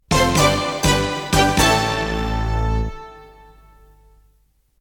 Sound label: News Sting